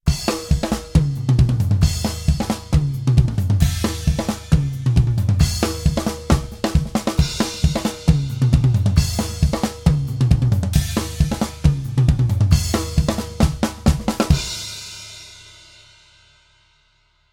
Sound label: Drum Kit; example WAV track files mix